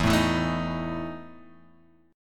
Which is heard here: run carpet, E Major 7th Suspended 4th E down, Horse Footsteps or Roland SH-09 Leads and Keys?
E Major 7th Suspended 4th E down